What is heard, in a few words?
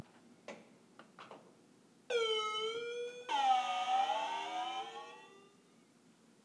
Doorbell